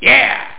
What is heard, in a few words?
DEFEAT